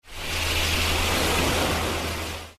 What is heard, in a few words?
wave sfx